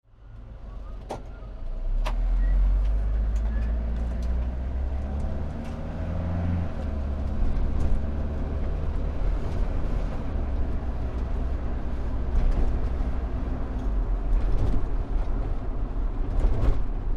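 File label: Taxi Black Cab - Driving around London - stops and starts - siren past; Some low humming and whistling from cabby; Car; Tom Hutchings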